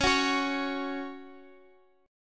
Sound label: F sharp 5th C sharp